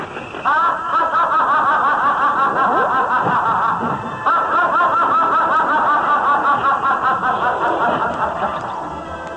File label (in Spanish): risa; Fantasmagórico